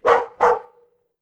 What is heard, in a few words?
animal dog bark